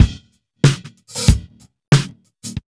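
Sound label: 94 Drum Sound, Pure Electro; USB Soundscan vol.03; AKAI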